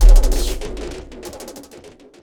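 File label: sequence tom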